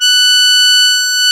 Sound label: MUSETTE F#4; USB Soundscan vol.23; AKAI